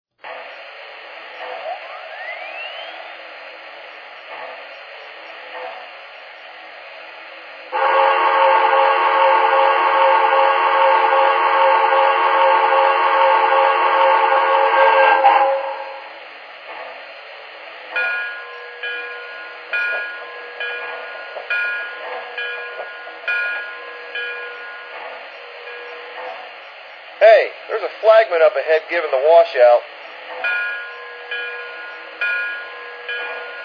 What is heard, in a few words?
hudson, trains